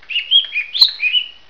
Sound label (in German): tschirp; Wellensittich